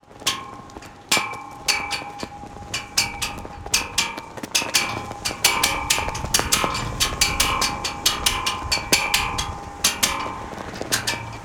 nature wind blowing flag flapping rope bang on pole; felix blume